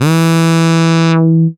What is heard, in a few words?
bse e, Rave Bass